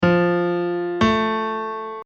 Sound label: Interval 8